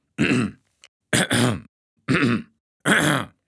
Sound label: Clearing Throat